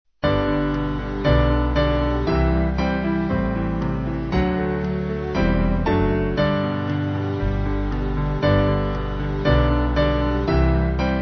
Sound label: Band